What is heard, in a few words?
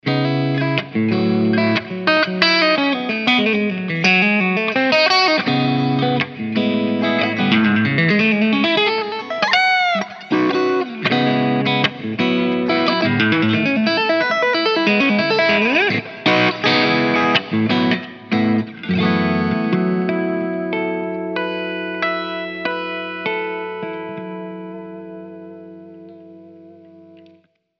Clean